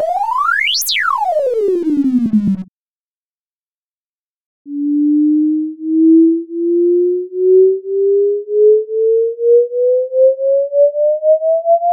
tones

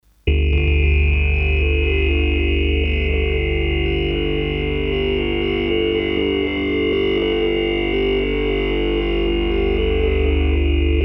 Big Droner, Drones; Best Of N2O Library; Drones & Foundations

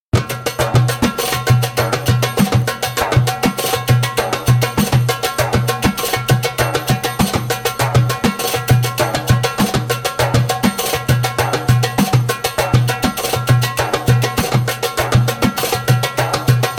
Ayub (fast), Djembolale; Djembolale - Grooves and Moves

